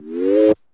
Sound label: SE GAME SLIDE; maimai